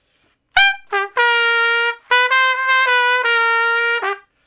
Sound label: Trumpet Shuffle (G); Solos